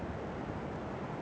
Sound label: desert, Ambient; MX vs ATV Unleashed